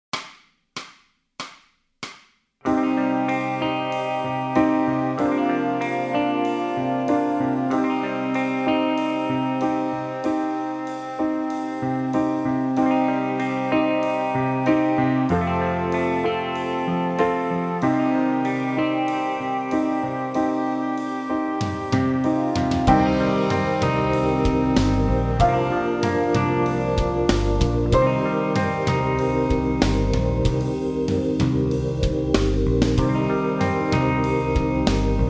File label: guitar scales, backing tracks